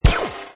Hi Tech Panel Servo Button

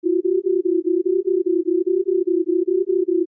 Scifi SFX Loop, Short; Stuart Duffield

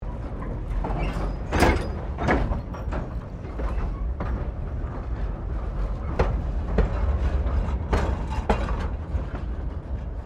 Train Goods Trucks Rolling Slow

